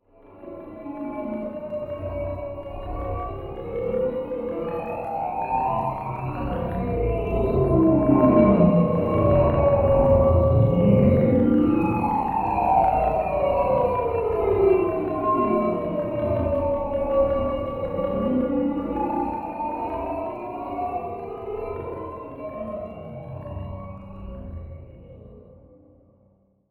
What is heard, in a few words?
Title Transition Stab Dark Long